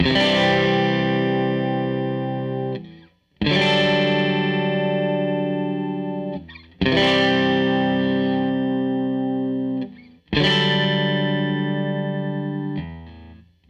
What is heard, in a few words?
Strat Guitar Loop